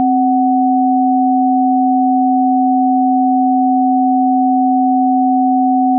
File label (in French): sine